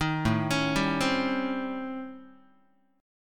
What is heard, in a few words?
A 7th Sharp 9th Flat 5th, Guitar; A7#9b5; arpeggiated; JGuitar